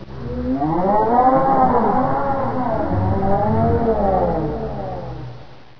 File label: pit drone die